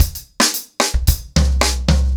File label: 110BPM, ACOUSTIC DRUMS